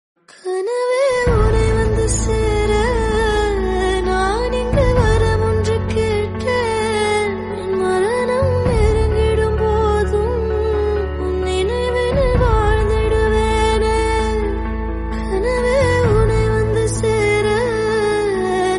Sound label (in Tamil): tamil